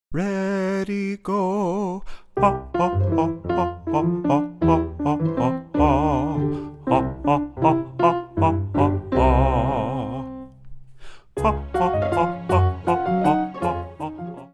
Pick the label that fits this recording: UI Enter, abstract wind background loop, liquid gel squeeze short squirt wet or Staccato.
Staccato